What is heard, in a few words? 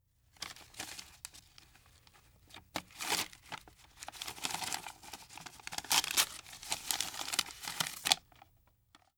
Paper Movement on a Roll; Fairlight Sound Lib